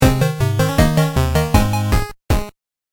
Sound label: Death; collateral thinking wii u gamerip 2016